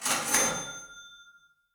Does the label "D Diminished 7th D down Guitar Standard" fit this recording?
No